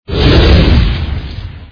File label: dragon breath